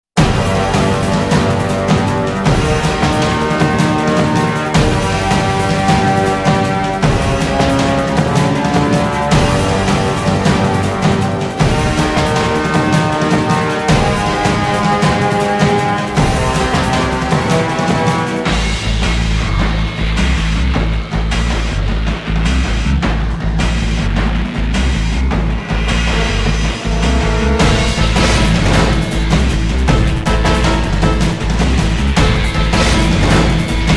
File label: battle; wot